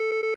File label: defib safety off